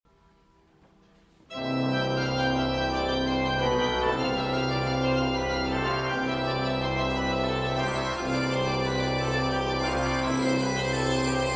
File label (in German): Orgelgebrause; Ostern